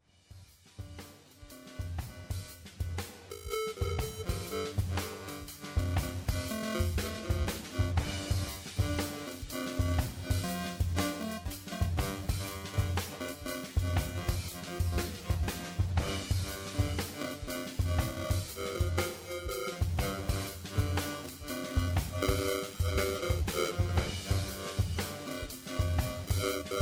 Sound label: SV480 wurly; nyquist